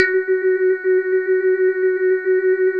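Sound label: synth Glasy; Best Service Gigapack 1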